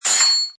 Metal Wrench drop; Metallic; Multimedia; Lynne Music

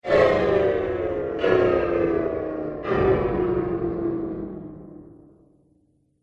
Ufo Grinds To A Halt; Spacecraft Sound Effects; Science Fiction Sound Effect; Andrew Fleming

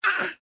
Human Grunt; Grunt Male Toddler; Grunts; Blastwave

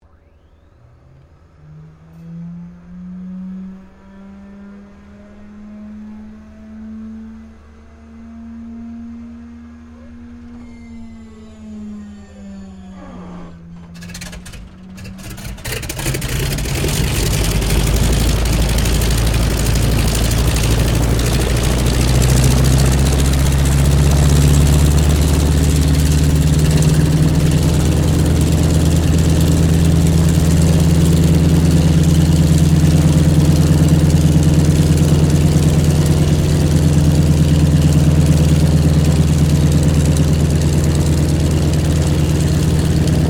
AIRCRAFT AT-6D HARVARD MK III STARTUP TAXI; Harvard Training Aricraft; Airplanes; Vehicles

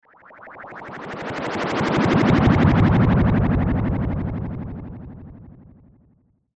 Whoosh Fast Sweeper; Blastwave; Whoosh Sound Effects

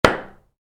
Hammer: Impacts Wood; Hammers; Tools; Stuart Duffield

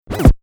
Turntable Scratching; DJ (Sound Effects:Musical)

